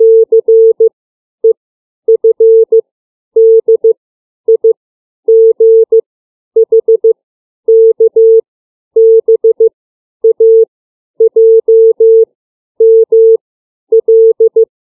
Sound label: CW alphabet